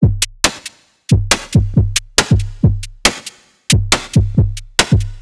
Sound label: intro drums